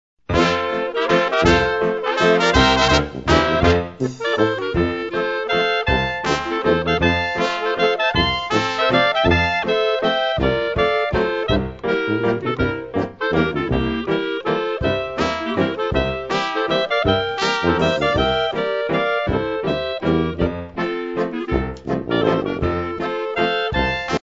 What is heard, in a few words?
waltz